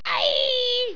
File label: scream